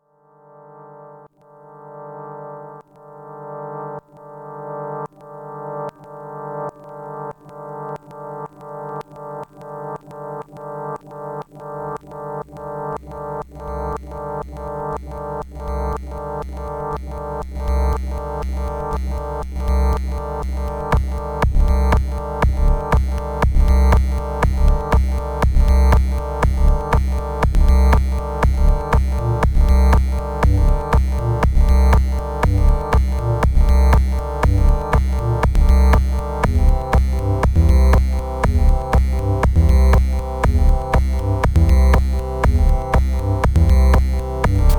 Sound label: dmg lsdj synced with gba micro